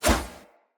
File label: Short Sword Whoosh; Weapons; Player